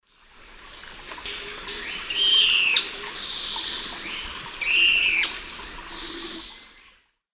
Philydor erythropterum, calls; S. America; Eastern Lowlands

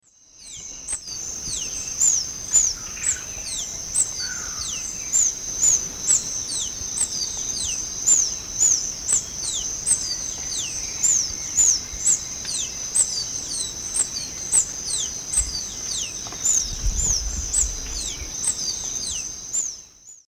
Phaethornis atrimentalis, song; S. America; Eastern Lowlands

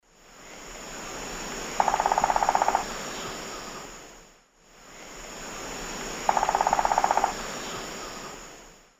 Celeus torquatus, drum; S. America; Eastern Lowlands

